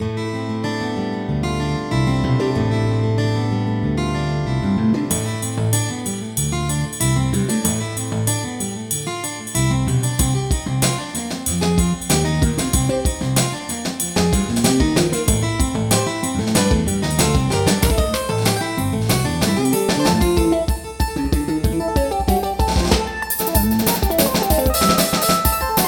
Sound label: Guitar Thing